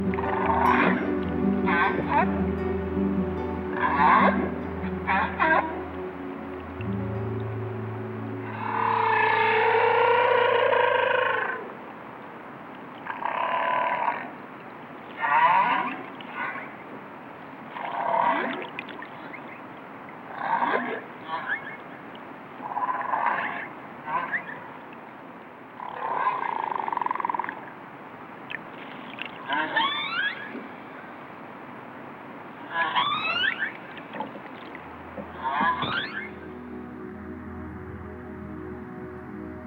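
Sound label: whale, narwhal and humpback whale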